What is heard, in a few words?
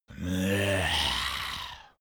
zombie moan idle throat